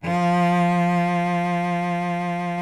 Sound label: SOLO CELLO F2; Greytsounds VOL-1